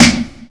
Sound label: airy snare a sharp key 160, drum single hits one shots